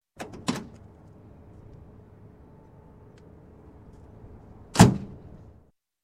Truck Door Open and Close; Sound Ideas 2000 Series

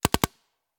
Paintball Gun; Sound Ideas; Guns

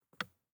DICE on Glass, Grab, Standard, One Die; Samples; ArticulatedSounds; DICE Sfx Party (Extended)

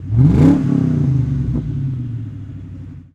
Mustang Rev; Automobiles

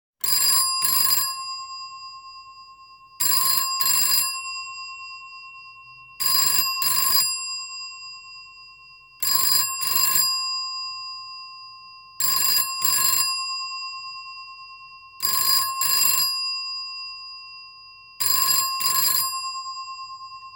Telephones; Industry & Commerce

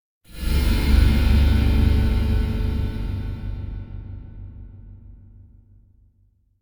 Metallic Impact Occult Finale; Hollywood Edge; sound designer's toolkit v2

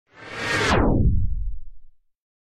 Tape Machine Slow Down Stopping; Hollywood Edge; sound designer's toolkit v2

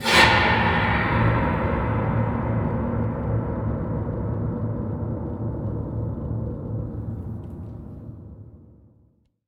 screwy scrape, Cinematic Metal Impacts

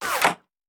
Bullet Impact; Sound Ideas; Guns

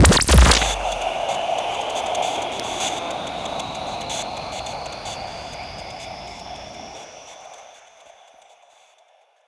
Artificial Breather; Plastic Surgery

